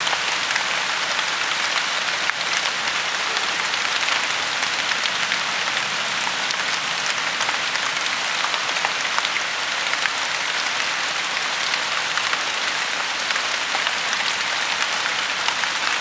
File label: GF Rain; gnarled forest